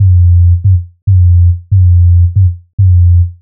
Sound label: 140 F BASSLINE, Dubstep; Loopmasters; The New Sound Of Dubstep; BASS LOOPS